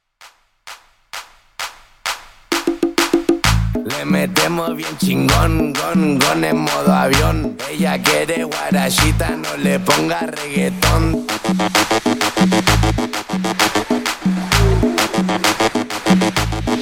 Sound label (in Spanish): guarachita